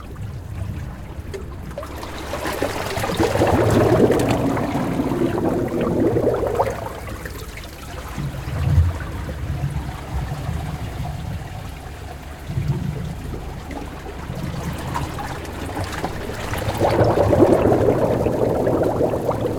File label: Water, Inlet, Wash, Bubbling, Between, Rocks, Liquid, Bubbles, Loop; Mattia Cellotto